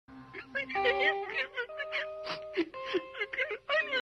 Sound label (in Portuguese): chorando